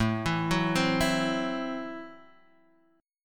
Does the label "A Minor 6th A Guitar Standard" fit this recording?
Yes